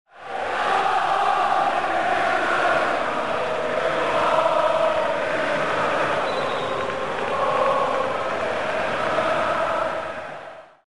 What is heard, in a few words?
crowd